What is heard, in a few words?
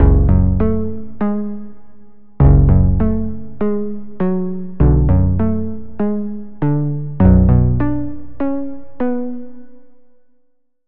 pluck, Sylenth1; Routed Sound; Sylenth1 Expansion - Street Vanquisher Vol.1